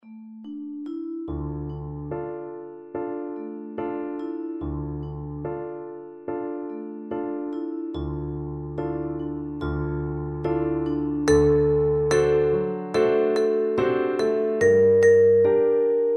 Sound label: bella ciao, percussion